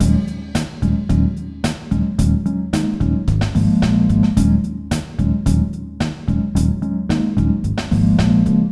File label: loop